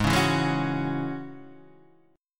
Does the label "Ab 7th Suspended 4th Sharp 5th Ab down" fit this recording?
Yes